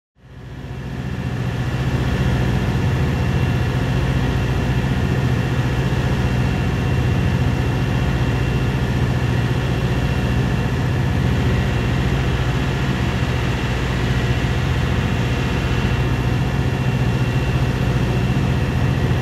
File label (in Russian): ozhidajuschij lokomotiv